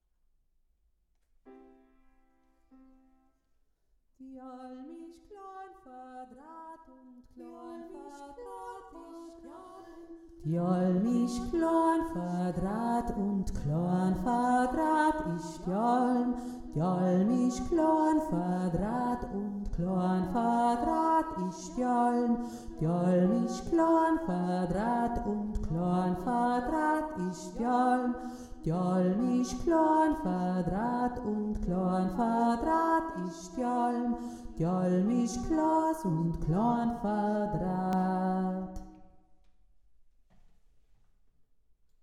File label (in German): d alm isch kloan verdraht tiefe stimme, jodler